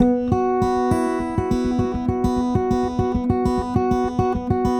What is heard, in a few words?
Gtr; Sonic Foundry (Sony Creative Software); Friendly Fire